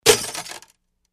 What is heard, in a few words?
Step Picture Frame, footsteps